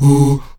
AHOO C#3E, Heart of Africa, STAC.OO-V; Spectrasonics

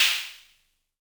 Snare, Univox SR-95

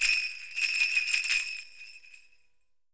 SUZU, JAPAN PERC; AKAI S6000 CD-ROM; WorldSounds

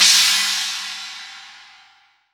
CYMB, CHINA+SPLASH

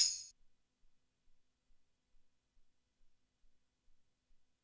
Tambourine, ELKA X1000

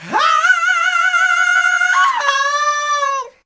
VOCALS; Ueberschall; Jam Box

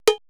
PERC MIX

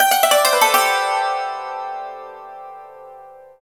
YANG CHING, Heart of Asia; Spectrasonics

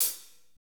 HAT, Drumscapes Roland; Northstar - Drumscapes Roland

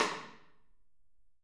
SN, Drums Percussion; CMB Kit 1

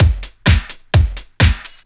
Straight Loop 128 BPM, House

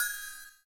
CYM, Ethno Techno; ILIO - Ethno Techno

